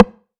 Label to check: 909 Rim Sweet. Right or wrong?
Right